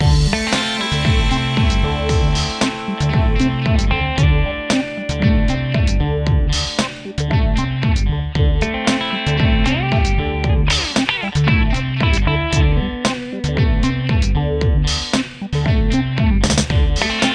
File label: Guitar Melody Over Electro